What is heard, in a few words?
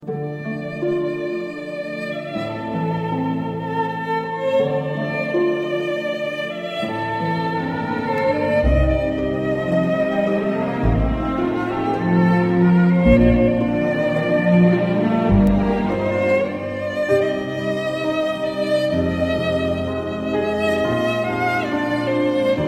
sweet violin, ringtone; blues